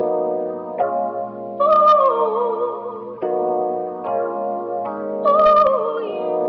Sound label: vibes and voice ooh yeah; Loops; Phonky